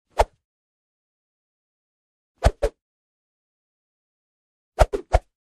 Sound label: Swish Med Low 3x